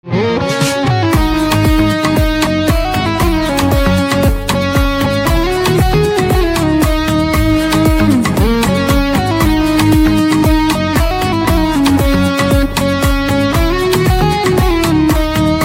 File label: Electric Guitar